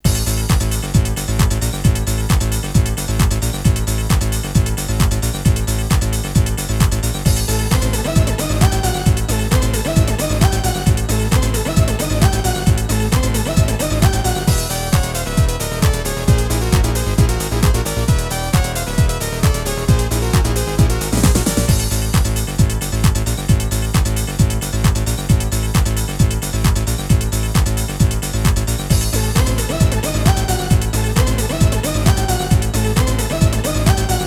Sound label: Pre-Game Arrangement BGM